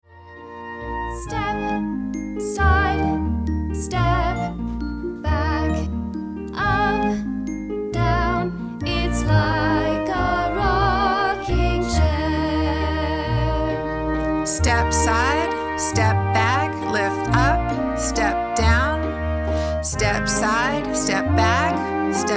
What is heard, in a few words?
Balance is in Waltz Time Dance with VO